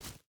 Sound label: footstep hide forest jump start; Rust